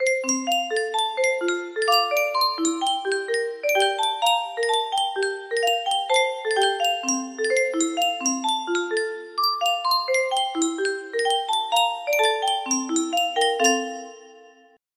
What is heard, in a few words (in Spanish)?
yunsheng caja de musica